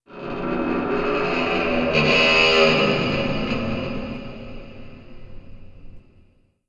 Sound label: Rocking Metal; Myst III Exile